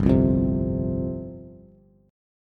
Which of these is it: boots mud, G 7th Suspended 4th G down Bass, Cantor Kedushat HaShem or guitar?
G 7th Suspended 4th G down Bass